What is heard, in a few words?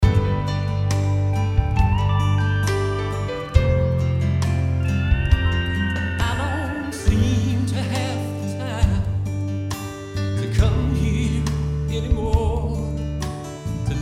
Mix Dream Verb